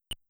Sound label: Menu Beep; mother1